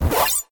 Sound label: powerup; Nickelodeon - Capture The Slime